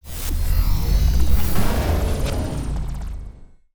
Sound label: machine death